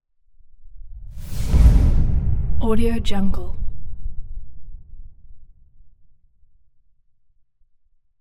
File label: deep impact whoosh